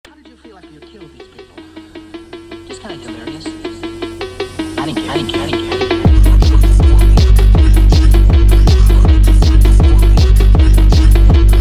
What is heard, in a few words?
drift house sped up